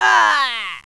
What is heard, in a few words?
DIE; Caesar III